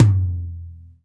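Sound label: tom, acoustic toms; Roland - Rhythm Section; Real Toms 1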